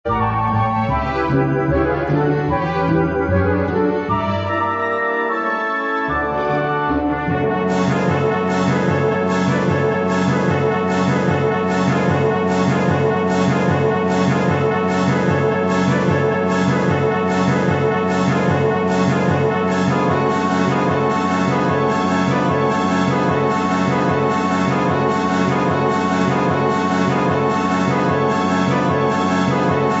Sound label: Nutcracker Suite - Reed Pipes Dance